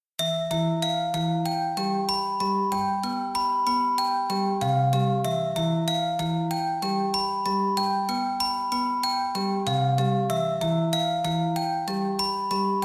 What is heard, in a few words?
gamelan; Indonesien; Bali; Java; Samplelogic; Gamelan Musik